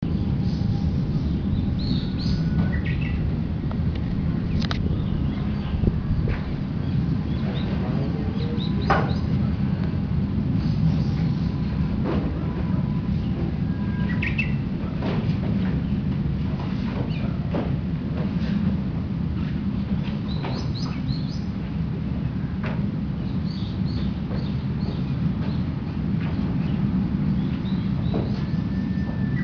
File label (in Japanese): uguisu